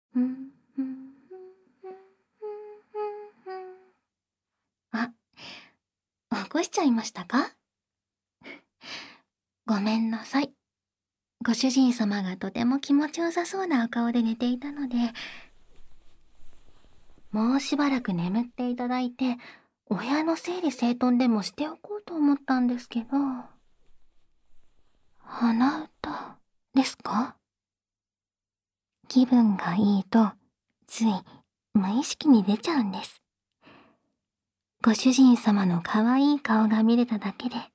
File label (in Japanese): 歌 を 歌っていたら; 「歌を歌っていたら起こしちゃいました」